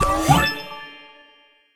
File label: win; ballio4